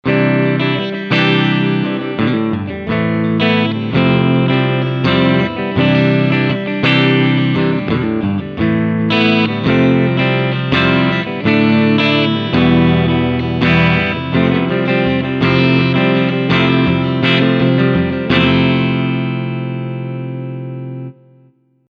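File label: Strumming Middle Steg Pickup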